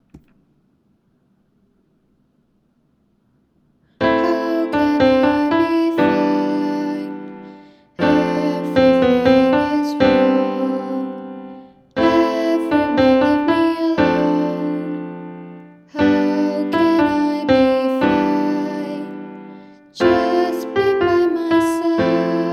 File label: Original Melody